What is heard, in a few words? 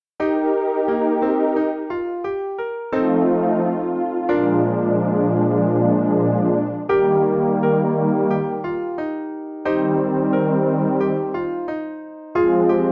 Accomp